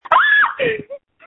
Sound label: Scream